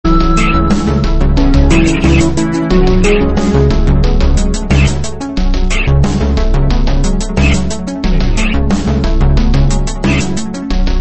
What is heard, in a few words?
Slightly distorted version; Mutants